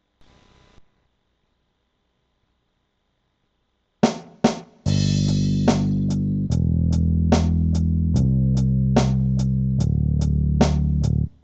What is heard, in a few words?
drums bass